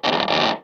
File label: balloon strech scratch